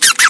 scared, antlion grub; hl2

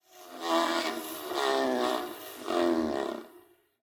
Bike, Transportation; Nikko Barrera-Amaya

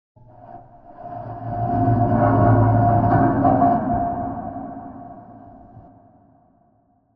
Cinematic Metallic Riser, Trailer, Designed; Jake Fielding; Haunted Metal Vol.2; Cinematic Creaks & Risers

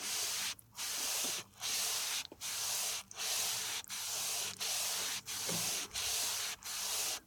Cloth, Cloths & Sponges Foley; TheWorkRoom Audio Post

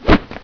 throw mode, slam, weapons

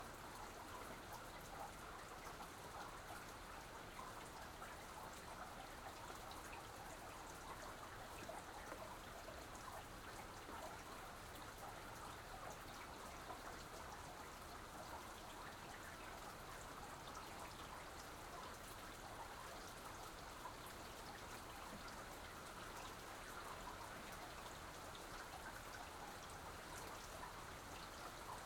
Rain EXT Porch, Soft rain; Indoor Ambiences; Borg Sound

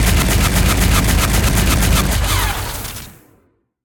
Blaster Machine Gun Burst, Future Weapons; SoundMorph

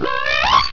houndeye pain; hl2

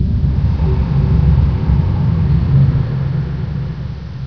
ambience; hl2; kraken

